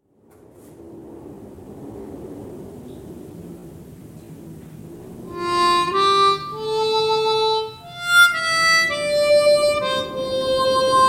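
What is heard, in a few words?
solo F, Mundharmonikas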